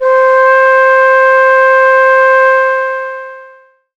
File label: flt, Ethnic Synths